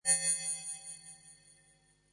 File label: Space Needle, Resonant, lingering, space scanning sound; Lynne Music